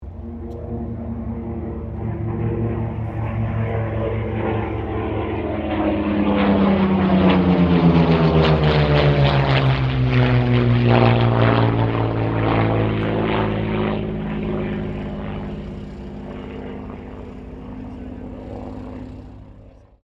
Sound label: AIRCRAFT P-40F KITTYHAWK PASS; 1942; P-40F Kittyhawk / Warhawk/Tomahawk; Airplanes (Sound Effects:Vehicles)